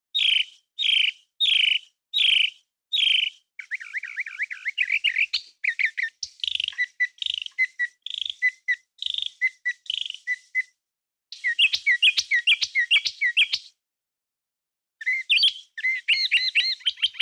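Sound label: mockingbird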